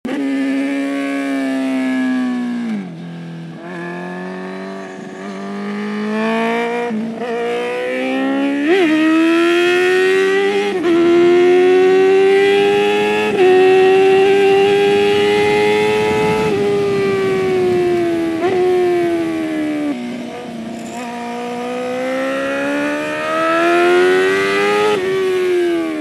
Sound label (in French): au taquet, moto